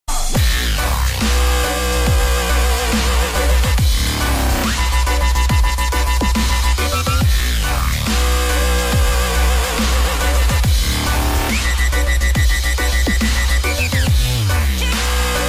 Dubstep

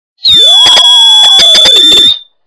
Alien Msg

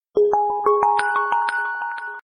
Ubuntu Ringtone

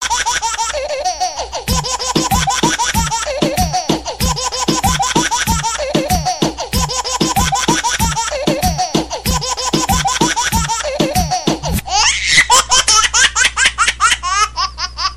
Baby Rap

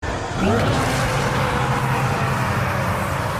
Roar English; Goku UI

